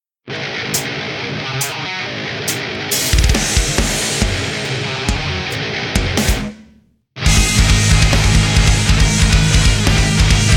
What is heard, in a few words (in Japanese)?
バンド伴奏